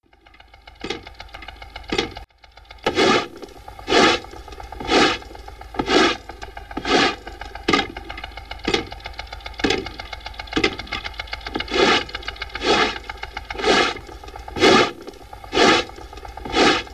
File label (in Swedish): Spånhyvel; stockholm